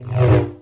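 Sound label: sith lightsaber Swing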